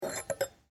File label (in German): Glaeser